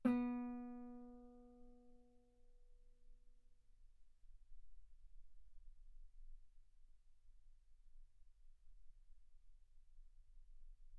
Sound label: Guitar ff sul B B3; single mic